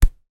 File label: human feet hit together